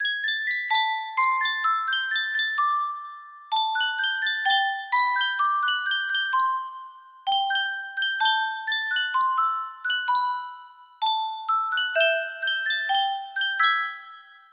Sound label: Sankyo music box